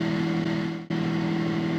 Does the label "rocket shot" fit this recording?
No